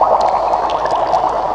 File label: BUBBLY, creepy sounds